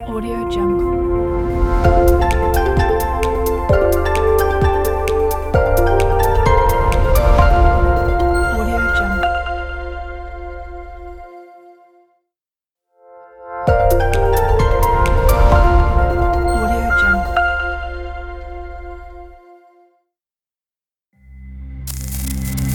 business and technology logo pack; sonorius

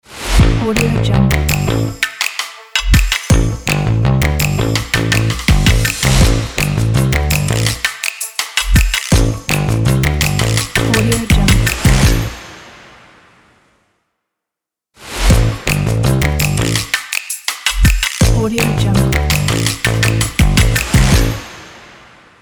upbeat percussion intro logo

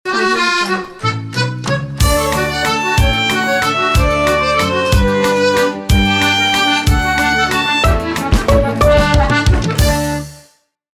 french logo

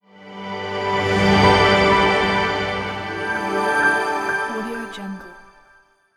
minimal logo intro

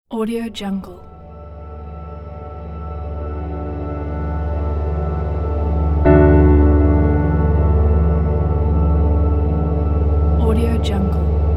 percussive soundscape meditative

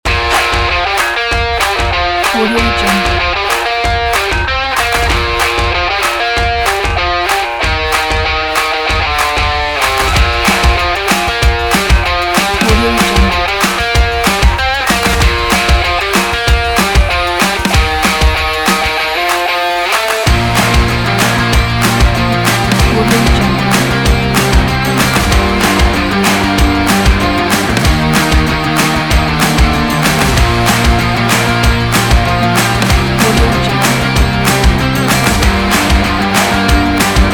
energetic indie rock upbeat fun

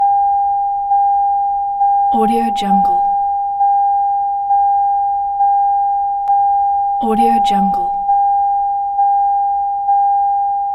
car key in ignition warning alarm chime; kilmermedia

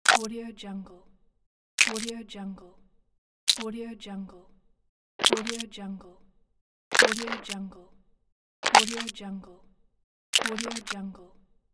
pills

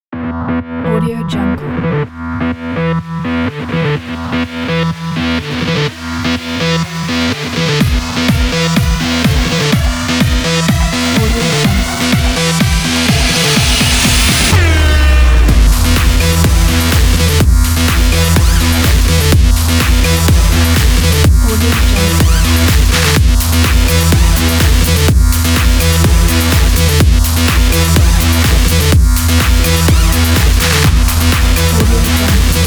powerful action sport; tape-machine

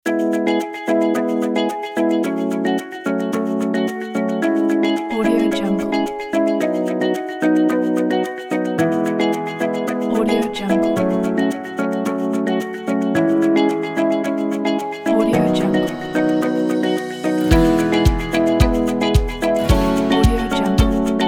inspiring glockenspiel corporate success

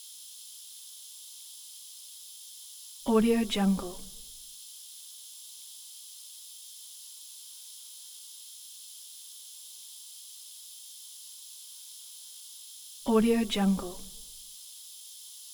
energy night goggles loop element; soundmorph

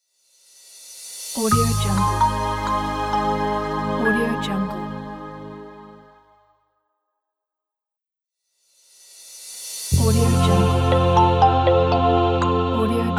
short intro logo pack

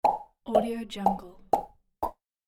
popups for vlog

